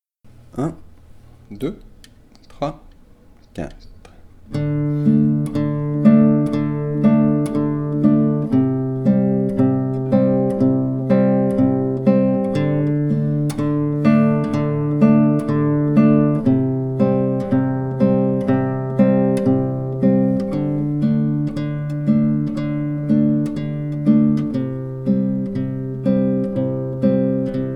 bigouden Dm lent; play-back de l'accompagnement pour jouer la mélodie par-dessus

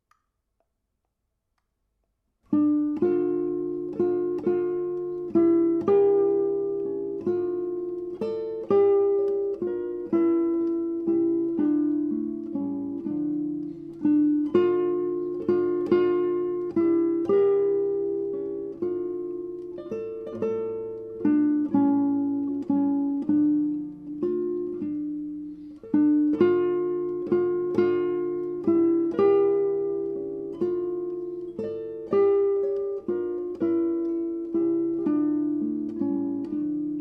variation guitare

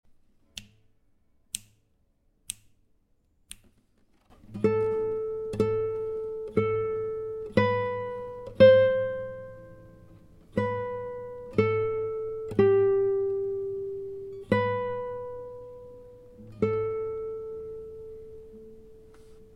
melo celte lent; melodie celte

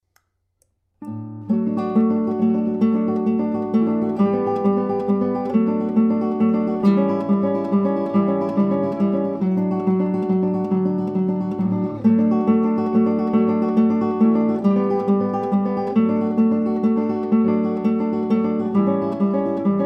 variation4 guit

